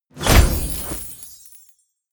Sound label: medieval collect loot heavy; swooshes-swishes